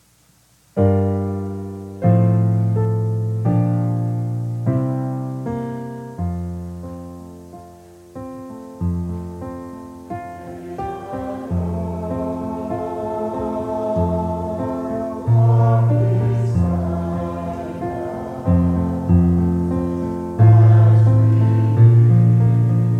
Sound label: Choir